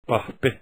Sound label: laugh